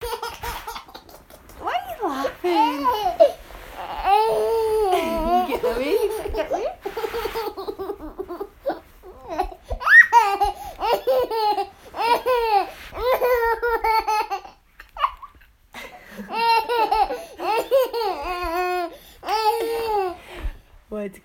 laughter

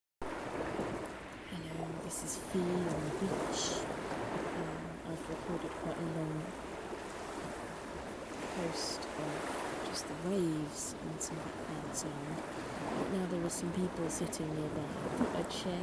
percussive pebbles and welsh waves

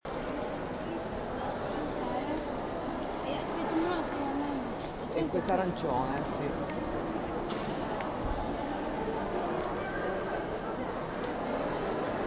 national gallery ambient

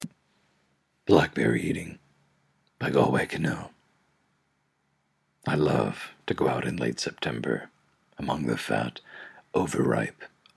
blackberry eating

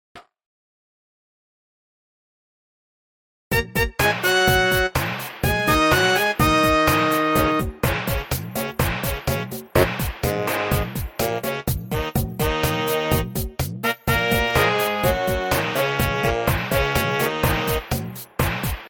pentatonic melody

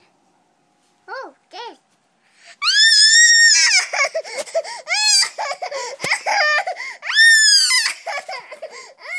scream

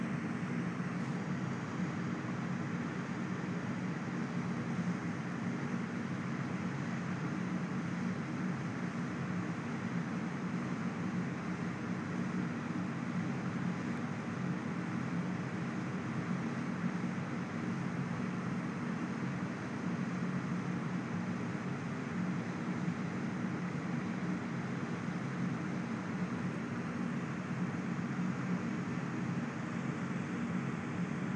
edinburgh machine ambient

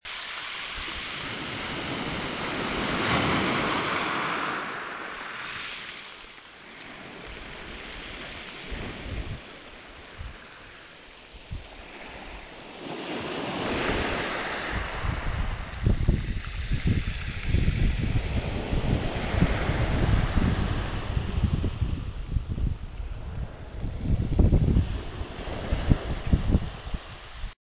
pebbles waves

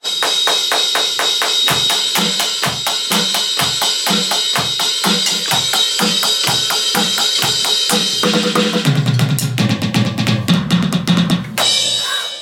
4/4 drum

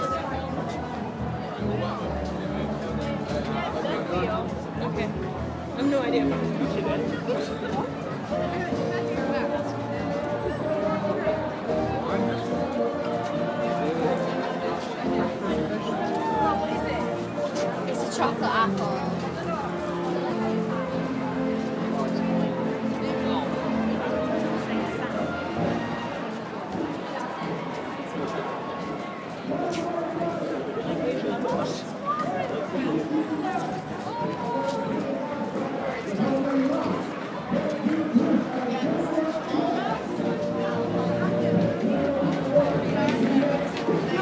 60 seconds of christkindlemarkt ambience; leeds; millenium square

